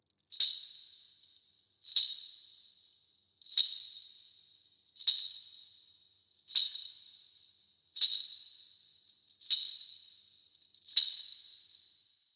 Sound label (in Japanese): 神楽鈴(手に当てた音